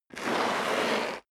step sneaker concrete grit slide long; schritte

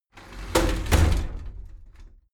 shower slide door inside close heavy

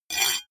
metal rattle short; industrie wirtschaft; metall

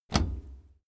bicycle brake mechanics release

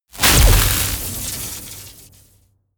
electricity; comedy cartoon

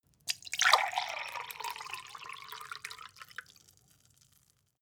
glass pour; soundpool

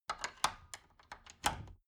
wood door old lock handling

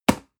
storage fall table hard, box; objekte

